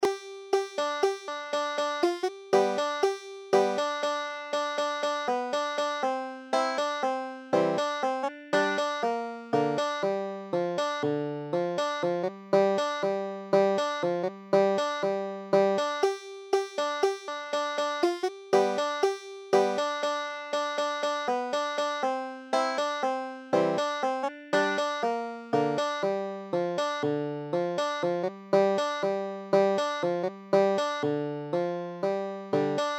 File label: banjo